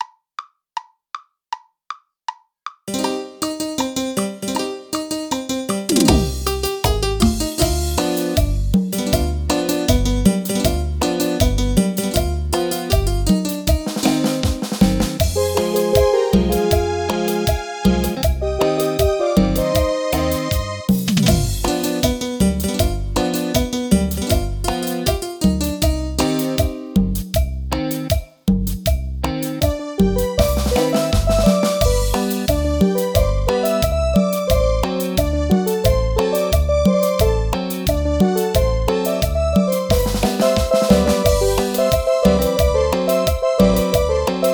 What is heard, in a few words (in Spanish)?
Cumbia